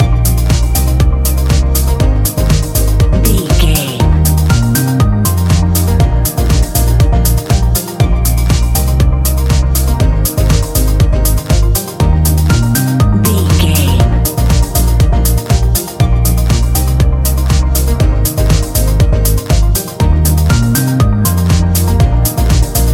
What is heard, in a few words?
A fast paced piece of club music perfect for the nightclub, and a youth orientated visual scene.